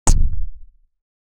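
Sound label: sound for a poisonous slime, projectile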